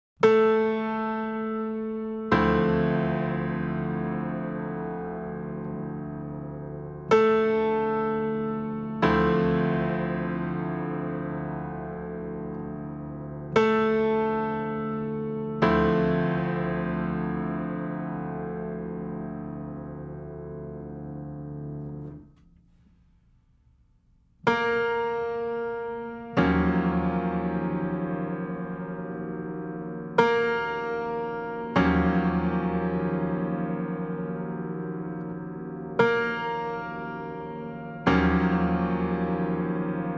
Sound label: tuning notes concert a bb